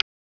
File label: Mac single click; K-12 Interface Study